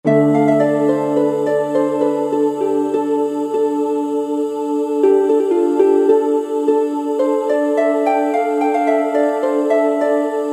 Choir-Harp

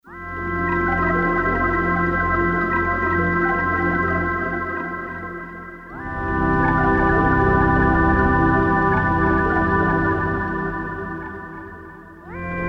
MYSTYPAD; Korg DSS-1; Lore, Comb, FX

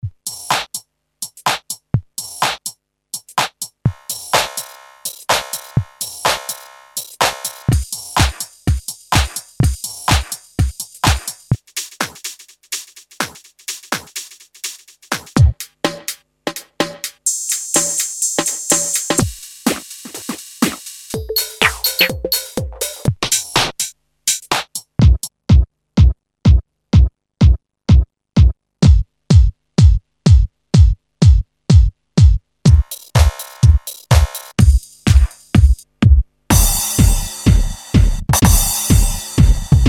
House 125 bpm; IK Multimedia; SampleTank Free

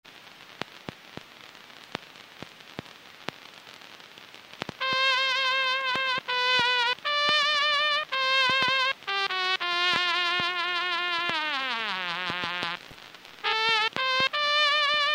Roland V-Synth; Factory Patches

